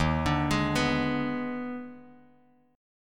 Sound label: Eb Major 7th Suspended 4th Sharp 5th Eb, Bass Standard; arpeggiated; 11,17,18,16; EbM7sus4#5